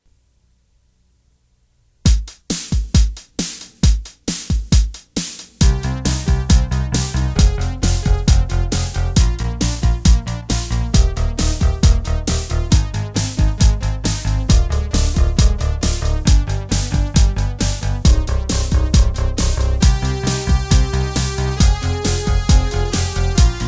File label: Bach Goes 80s Synth